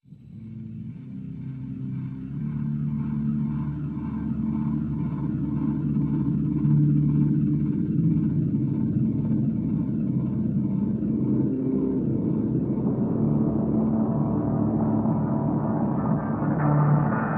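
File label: Drn High, drone; Drn Locust Nest High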